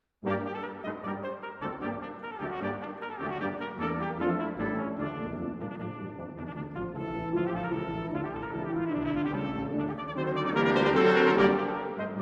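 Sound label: Concert etude in g minor for Trumpet and Piano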